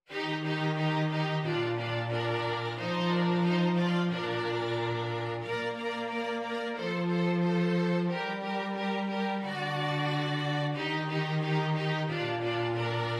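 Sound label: Jolly Old St. Nicholas violin violin cello; Christmas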